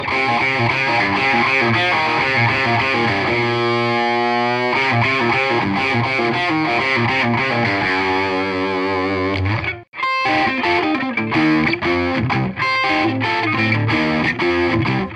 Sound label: licks